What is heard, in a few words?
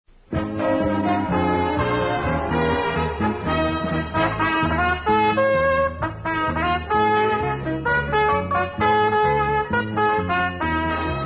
Trumpet Solo